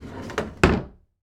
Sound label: Drawer Close; Haunted Bloodlines; SFX Producer